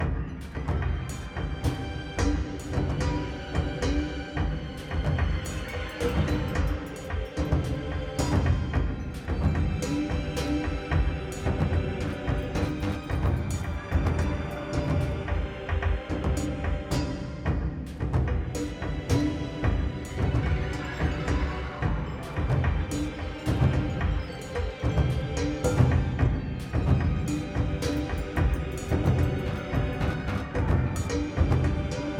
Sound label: Creep Medium